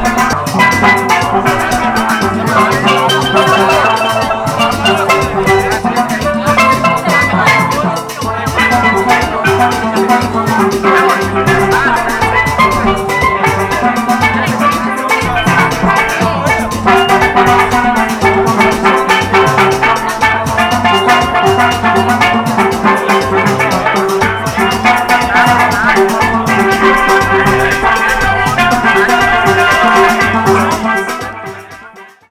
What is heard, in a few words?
steeldrum; best service Blue Box